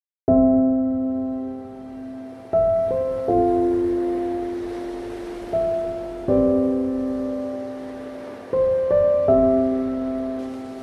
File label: calm music for baby with relaxing sounds of ocean waves